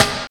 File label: SNR, Snares; AMG; Now CD-ROM (Roland); NOW! Drums